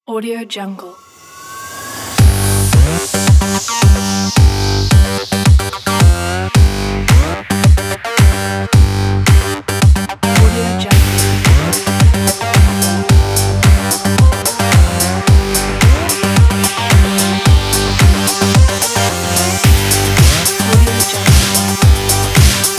sport energy

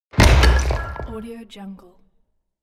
falling brick wall

